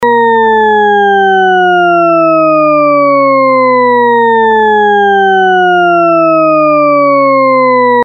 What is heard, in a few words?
falling